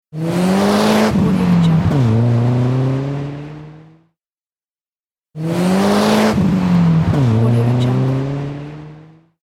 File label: turbo car drives away quickly